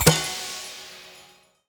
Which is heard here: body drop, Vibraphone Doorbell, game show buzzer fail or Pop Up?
Pop Up